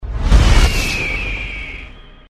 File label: eat intro; disneyxd; stuntastic